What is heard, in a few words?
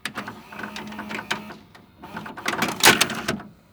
Tape Eject; GlitchNoise